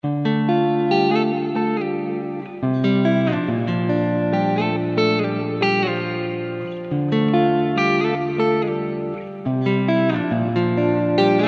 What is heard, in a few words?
Ambient Guitars